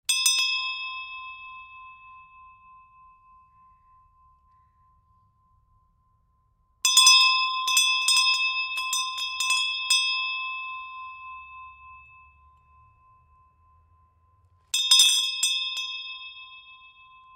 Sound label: School Hand Bell, various ringing; Tom Hutchings